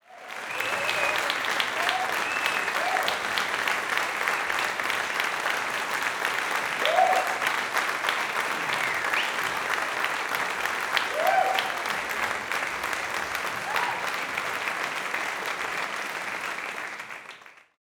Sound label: applause and cheering